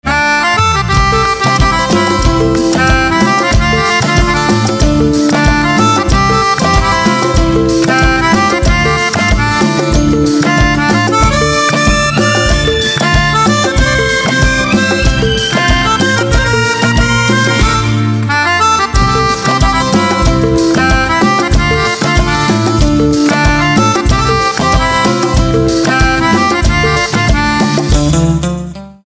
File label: Pianica